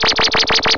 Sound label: IN ORBIT